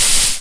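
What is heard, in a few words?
dig crumbly; default cool lava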